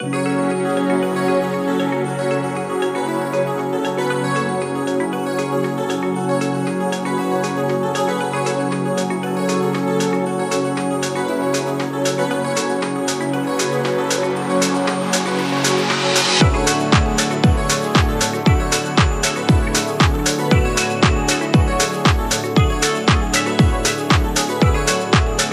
electro dance

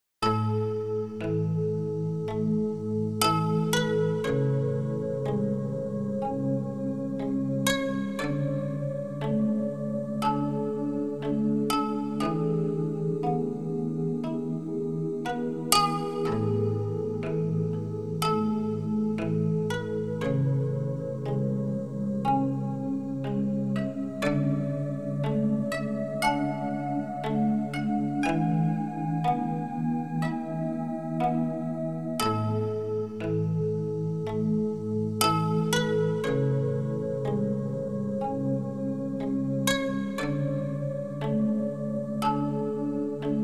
spa music, 432 hz

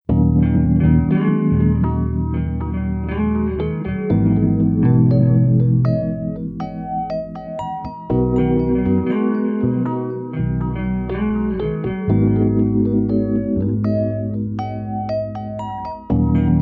guitar chill 432 hz

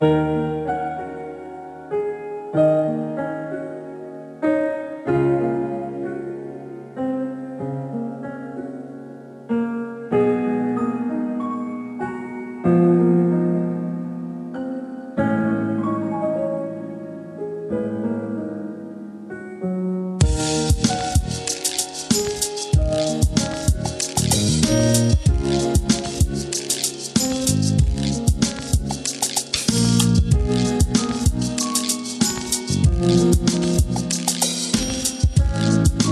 Rhythmical Funk Vibes, Relaxing Jazz

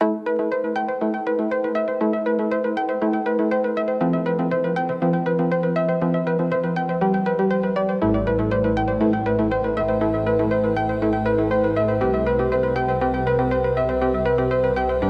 Electronic Impulse

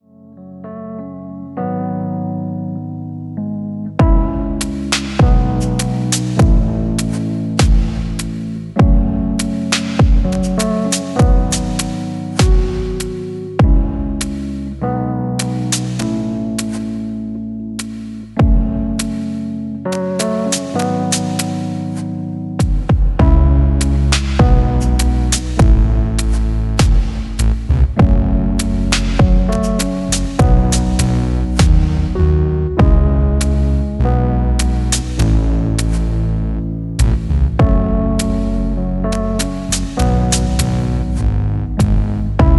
chillout; Whispering Wind

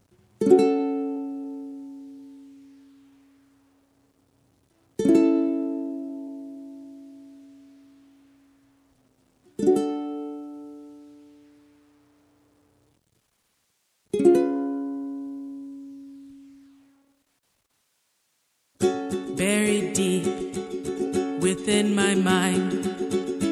chillout singing dance 432 HZ

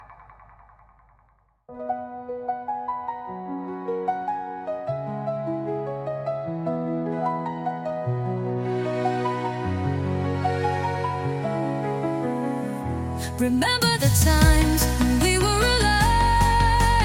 Trance Hold

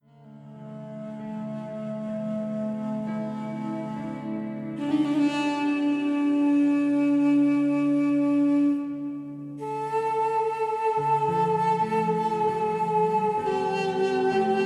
duduk, 432 Hz

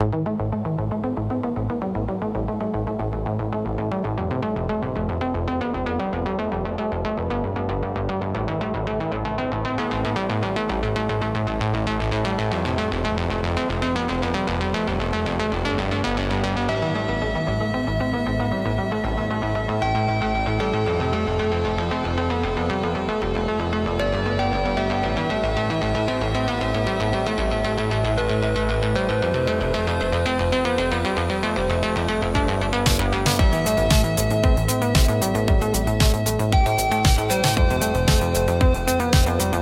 Electronic Impulse

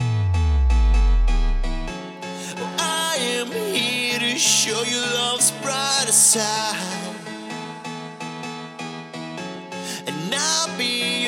House Grooves; House Grooves Unleashed

